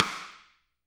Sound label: SD, Drums; Bob Clearmountain Drums I